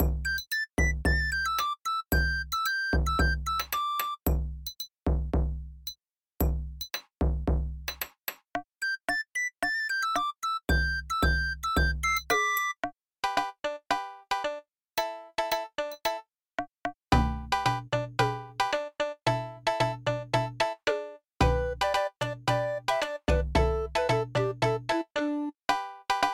samsung galaxy s23 ultra; spring blossoms

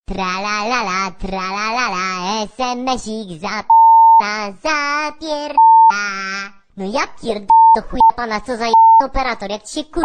tralalala sms

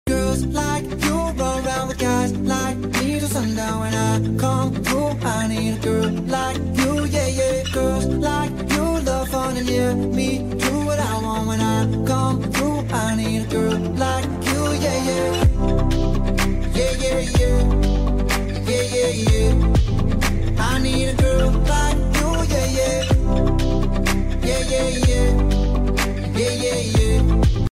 flute

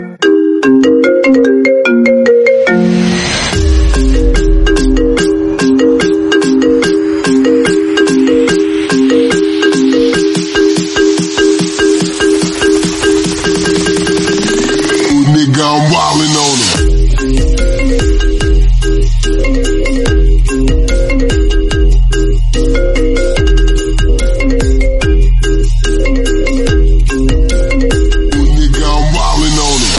dance hall marimba remix

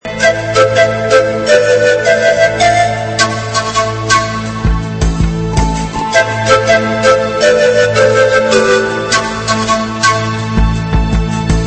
flute magic

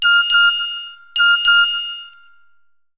nokia classic sms notification

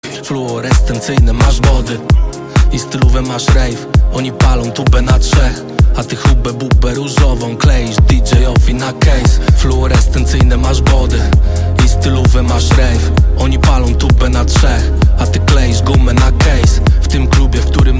techno

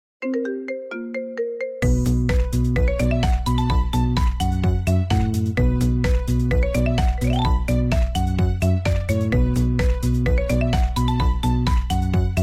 majestic marimba remix

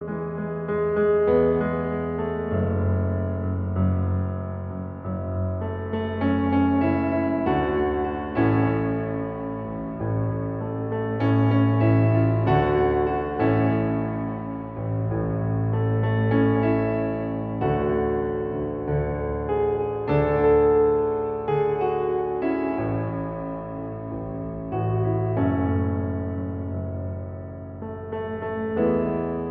piano

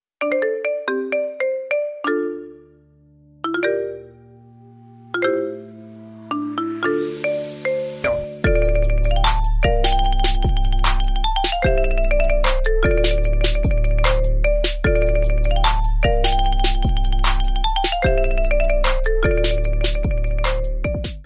marimba mix

